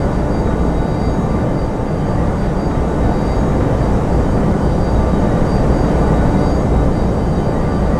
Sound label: Drone Ambient; Drums & Drones